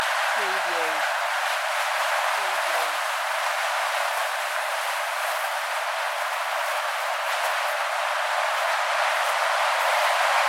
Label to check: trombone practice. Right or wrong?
Wrong